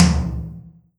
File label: Tom Low Acoustic